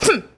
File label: Boxing Female Punch Grunt